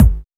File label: Bassdrums Breakbeat; Kicks; Vengeance Samples